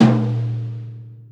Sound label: Tom Live, Lounge Single Drum Hitz